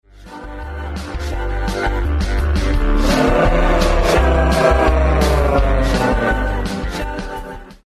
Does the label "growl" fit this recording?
Yes